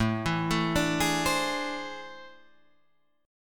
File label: A Minor Major 11th, down